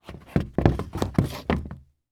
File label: Foley Footstep Golf Cleats Stumble On Hollow Wood Surface; Foley Footsteps